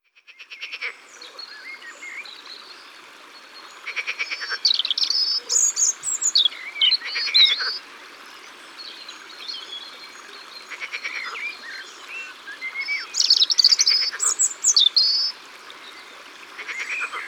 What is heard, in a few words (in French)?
Grenouilles vertes Au bord de l'eau